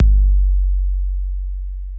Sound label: BD amiga Long decay, DRUMS; Polyend; Legowelt